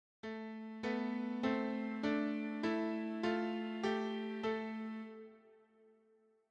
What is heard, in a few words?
diatonic intervals minor